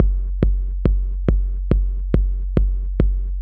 mr-beat

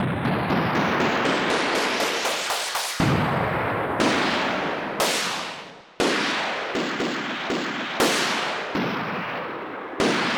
Gun Shot; FF7

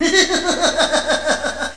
laugh; Doom2

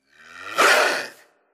leopard; WMBonusXP

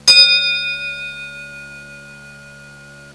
HI FIGHT, PERC

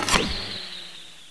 shot

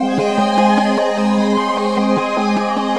SYNTH D+B 160 C, Drum+Bass, Keys; eJay

